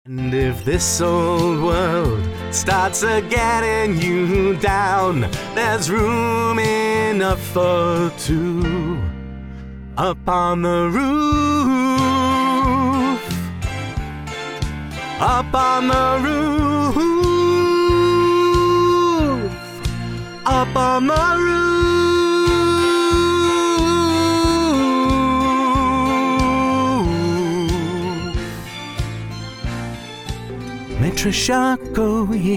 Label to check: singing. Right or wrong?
Right